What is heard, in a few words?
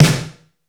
Rock snare; Reference Beat